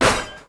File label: IMPACT Metal Scrap Pipe; stereo